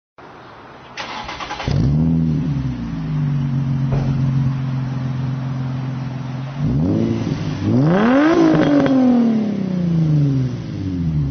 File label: Engine Blow